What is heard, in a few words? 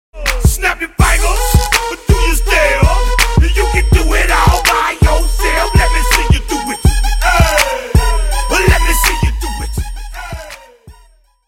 chorus